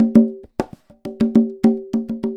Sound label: Conga Loop (100BPM); musicradar